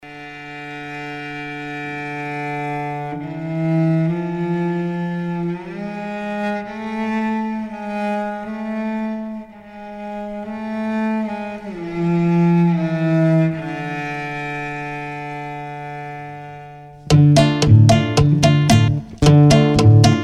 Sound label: instrumental; hace frio hace calor